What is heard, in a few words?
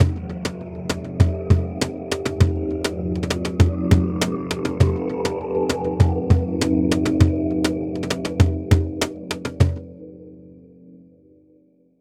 Musical accent tribal sacrifice spiritual dark rhythm kahon; SFXBible